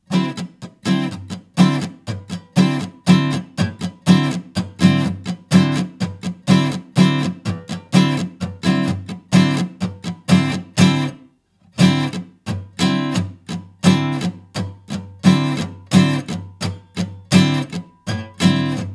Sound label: Funk strumming pattern, guitar